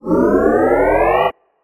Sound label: scifi weapon power up low